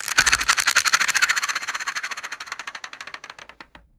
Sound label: plastic board game spinner spinning and stopping